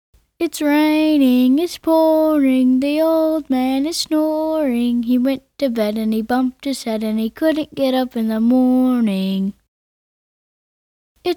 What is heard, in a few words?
rain